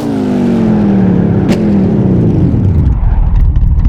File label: slowdown slow, vehicles cars; lwcars